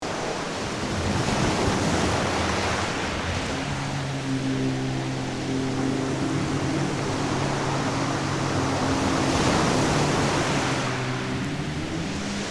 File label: Windy alien ocean planet atmosphere